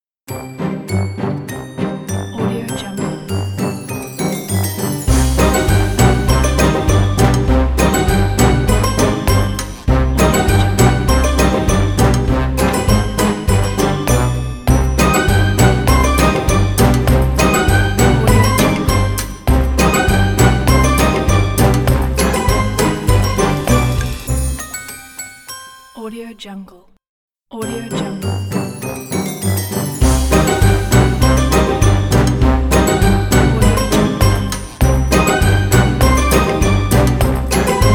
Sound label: halloween spooky intro logo; puremusic